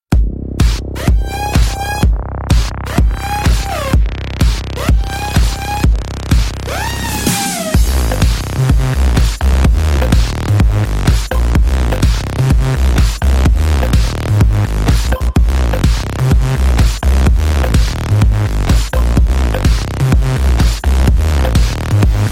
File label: Hiphop Sick Beat